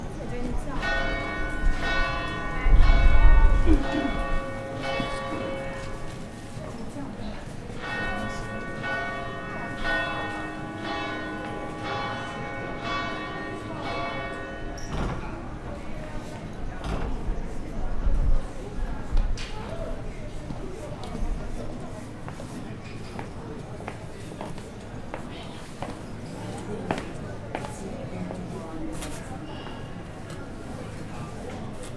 belefujt a szel vegyes

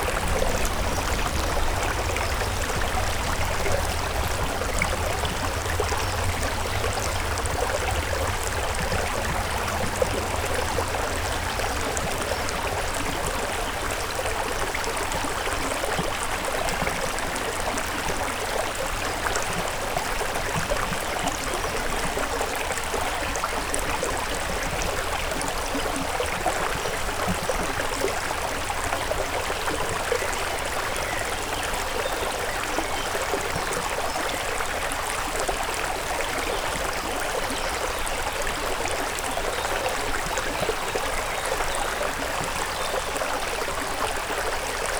szep hangu, patak; visegrad

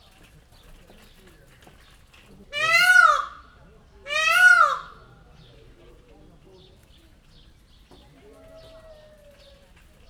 kétszer, indiai páva; Győri Zoo 2014

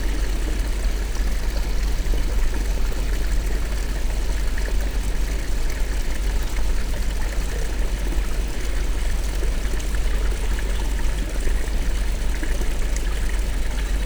vizfolyas ugato kutya

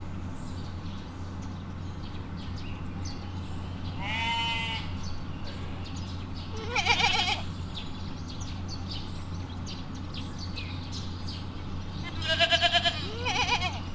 a vegere belejonnek, hazikecske; miskolc zoo

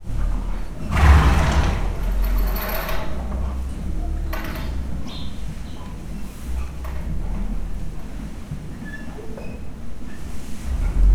prüszkölés munkagép, ló; Hortobágyi Nemzeti Park; máta

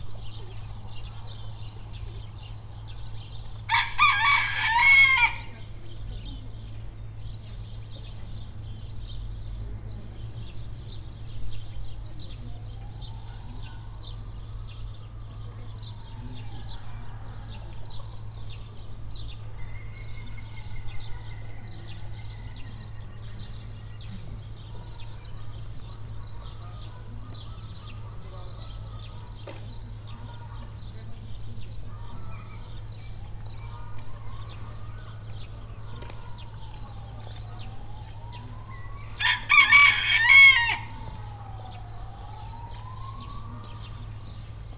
kétszer hallható, kakas, magyar tyúk; debreceni zoo 2012

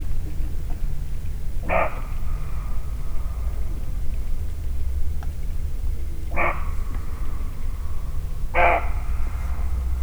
tobbszor, europaioz; termeszetben

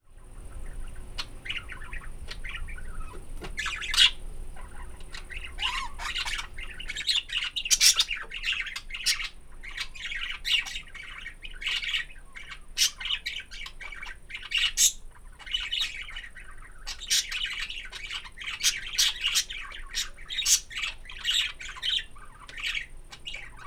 papagáj csivitelés, hullámos papagáj; állathangok; paraszt udvar

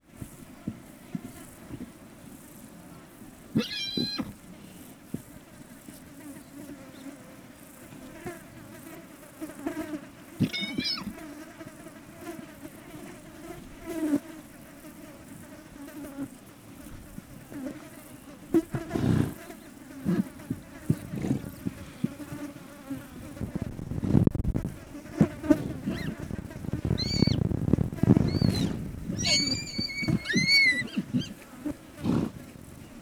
a szél elviszi a hangot, vadló; Hortobágyi Nemzeti Park; pentazug

